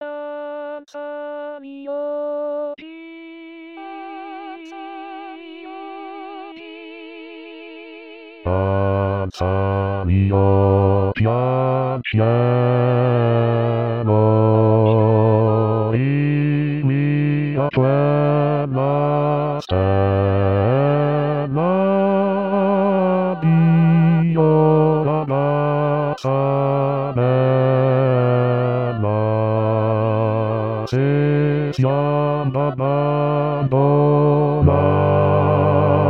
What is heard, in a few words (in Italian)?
basso in rilievo